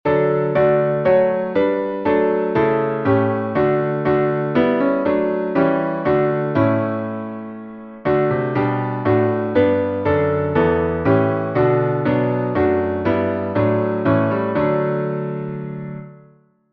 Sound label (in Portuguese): instrumental